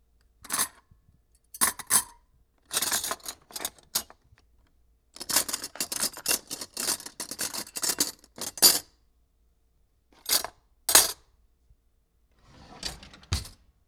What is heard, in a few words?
Utensils Drawer; Cooking